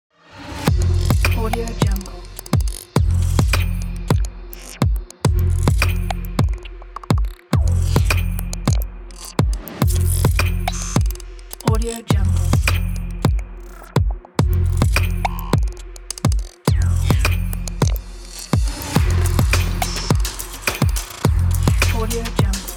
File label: dark abstract glitch